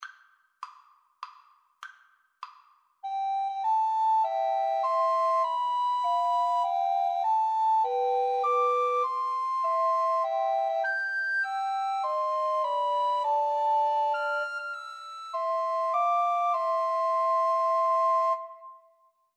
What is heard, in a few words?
happy birthday, Recorder Trio